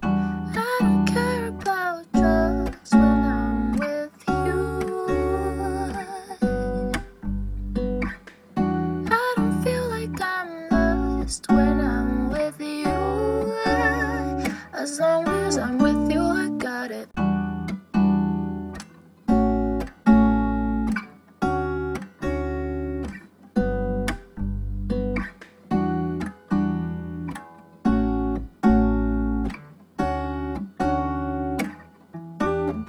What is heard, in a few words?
112 BPM D Maj, Melodies; Drugs; Cymatics; Immortal Production Suite Beta